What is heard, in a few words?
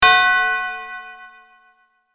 Short church bell ring